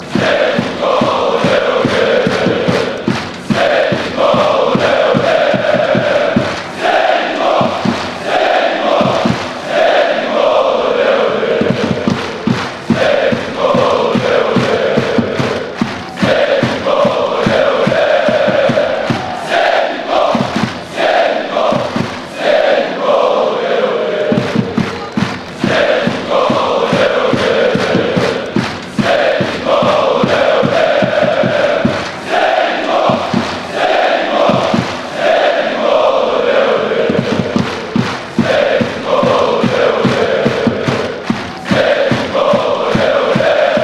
zenit goal ole fanchants

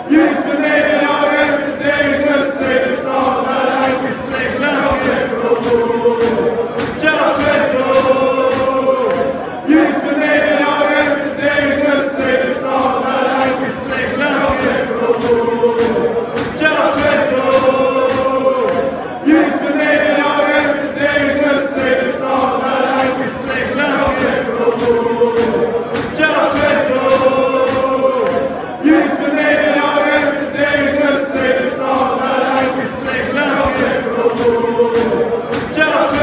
chelsea player chant; joao pedro

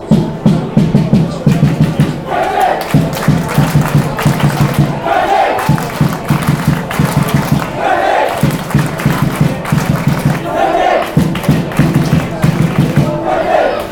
drums, fanchants; county

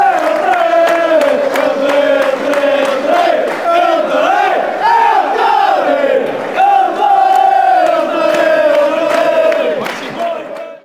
airdrie, chants

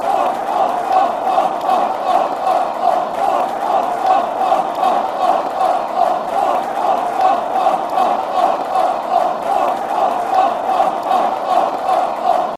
off off off, chants; scfc

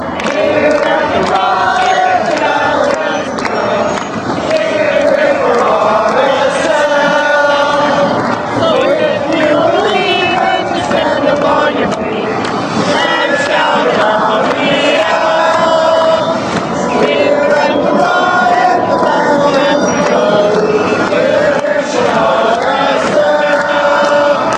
believe salt lake, fan chants

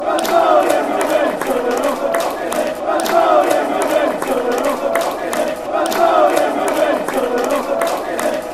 were pooleys, fan chants

